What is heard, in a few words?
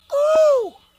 ooow